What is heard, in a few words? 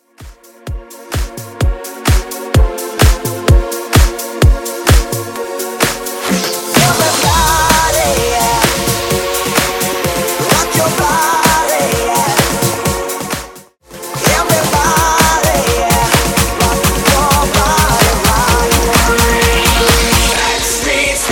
Clubing Bootlegz 128bpm; Snippet